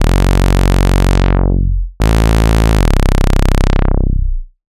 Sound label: unison synth sound